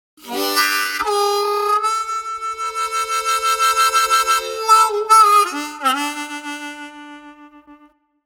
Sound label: harp